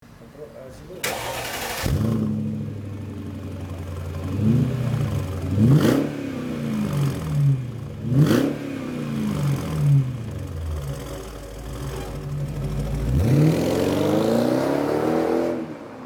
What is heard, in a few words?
Mercedes R500